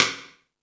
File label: Ambient Rock, drum machine; E-MU Pro-Cussion-1